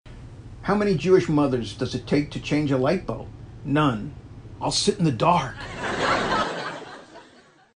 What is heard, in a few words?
Laugh Track; Judy Gold; Joke